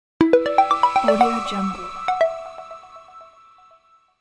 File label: medical research logo